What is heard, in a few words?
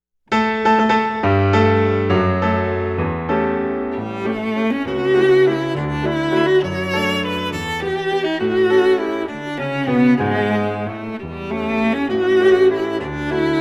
Marguerite Waltz